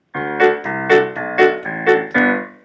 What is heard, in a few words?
D C B A G bass and chords, simple piano recordings